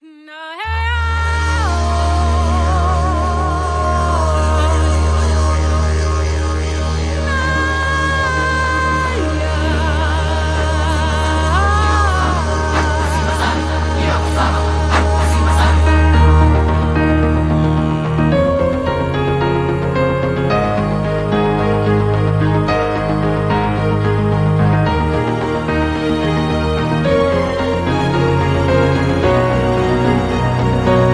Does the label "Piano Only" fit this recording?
Yes